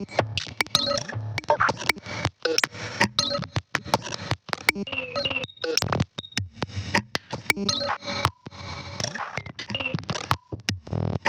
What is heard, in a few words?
perc akrasia glitch layer; Polyend; Recue; percs glitches and layers